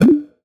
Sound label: Retro FX; Video Game; Cymatics; Video Game Sample Pack Vol 1